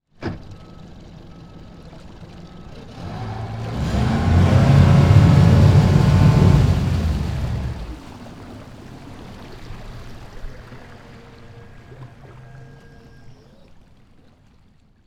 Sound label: Transportation Boat Power Boat Engine Starting